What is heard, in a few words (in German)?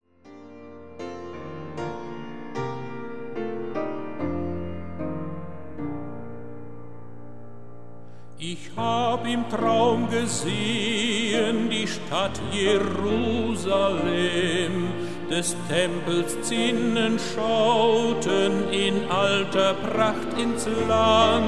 Chor